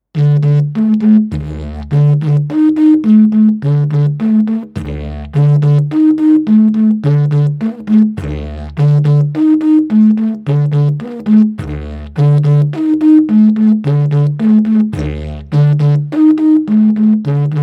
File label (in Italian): ritmo livello avanzato